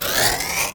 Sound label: Speed Up Magic